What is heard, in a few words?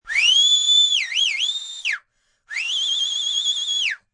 Whistle animated, Whistle, cheering, attention grabber (human voice); Whistling; Sound Effects:Voice; Voice Box